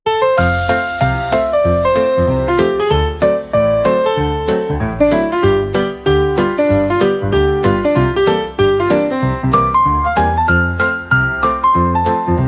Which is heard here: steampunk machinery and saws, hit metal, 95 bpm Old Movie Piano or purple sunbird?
95 bpm Old Movie Piano